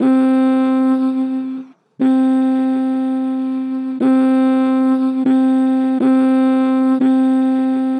Gen Flat Mmm, Vocals; Harmor Vocals

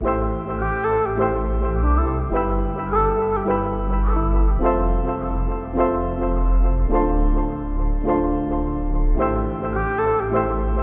105 BPM A Min; Gems Vol 6; Toronto; Cymatics; Gems Free Teaser Pack

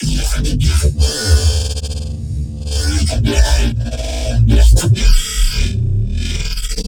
Bass Shot G Malfunction; Trap and Dubstep; Bass One Shots; Ghosthack; Free Trap and Dubstep Samples 2018

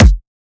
Kick; Heater; Cymatics; Future Bass Starter Pack; Drums - One Shots

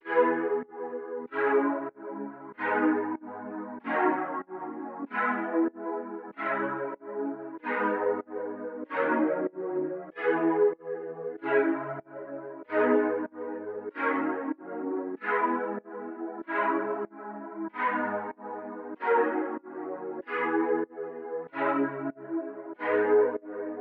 95 BPM B Min Keys, Latin; Melodies; Loop Stems; Cymatics; GHOST (Beta Pack); Aventador

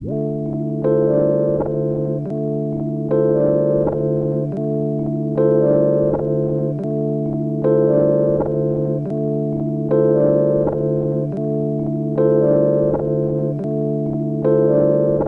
Tape Loop Melody D; Tape Loops; Melodies; Cymatics - Eternity Sample Pack

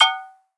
Perc; Percussion

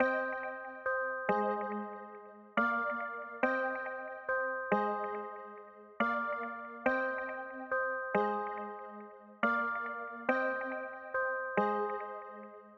Happy Melody Loop - 140 BPM C Min Chords; Melodies; Loop Stems; Cymatics; Oracle Sample Pack; Happy Melody Loop 2

